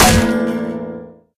guitar impact; tf

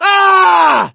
death scream, gambler, survivor voice; source engine; left 4 dead 2

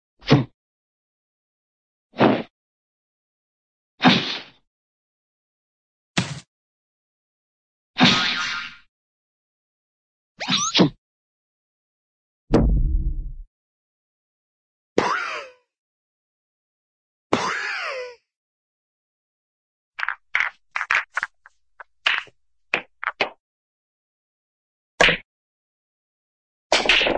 poof, cartoon poof

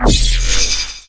portal fizzle, portalgun; Source Engine; Portal 2

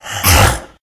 shoved, npc infected

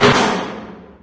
handle pushbar open, doors; Source Engine; video game

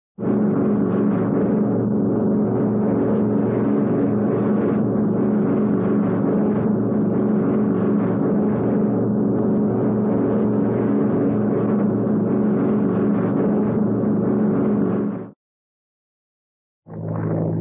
airplane, prop large prop plane; cartoon

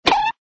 whisker pluck; cartoon; hannah barbera cartoon sound fx

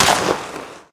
skating land; video games; player; taunt; skating scorcher; source engine; tf

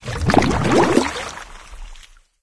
laser lifts goo up

